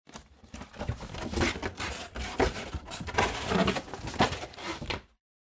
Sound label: Cardboard Box Fold; ダンボール; 畳む; 梱包材